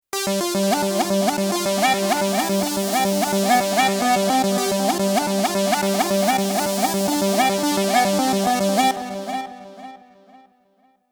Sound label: Mono Trekkies, Synth; SP4